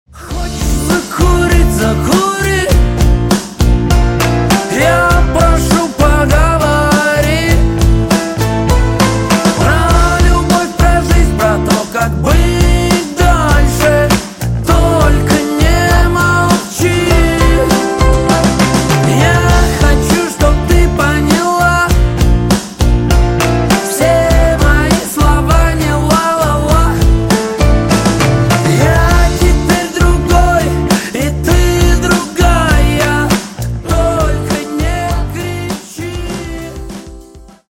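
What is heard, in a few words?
Ringtone for phone call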